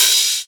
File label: Open HH, Cymbals